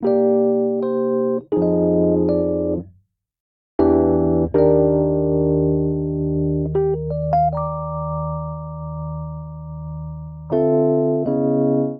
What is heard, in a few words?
Progression Soulful Jazzy vi - ii - v - I Eb Major 80 bpm, Electric Keyboards; Ableton; Factory Packs